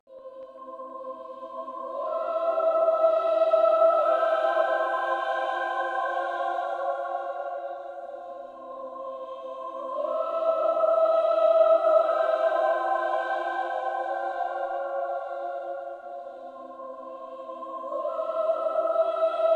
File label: Choir; Hitman Blood Money